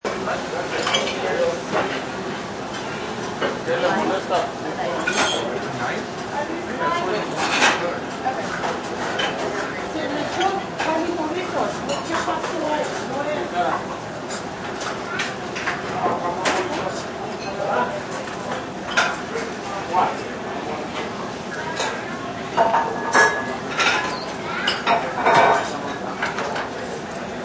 New Mexican Diner, Diner ambience; Restaurants

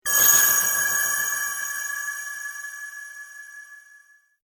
Eerie Chimes Scary Suspense Dark Metallic; Horror; Scary Tones; BBFX

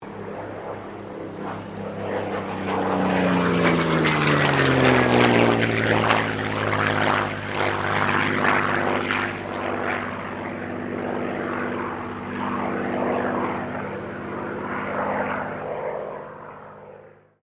AIRCRAFT SUPERMARINE SPITFIRE MK-XVI PASS

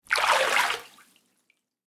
Water Movement Tub, Water, Movement, Large, Tub; Nature; BlastWave

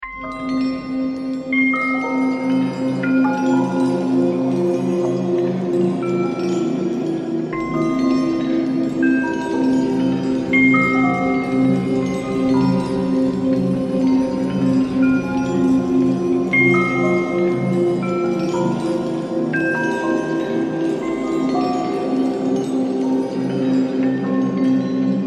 Film Atmosphere Creepy Chimes; Transition Sound Effects

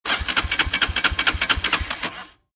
Motorcycle Dirt Bike Engine Turning Over

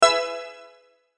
chime bright; Multimedia; Chimes; Blastwave

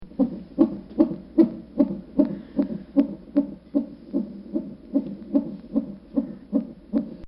Fetal Heart Monitor; Heartbeats; Human; Bobby Cole

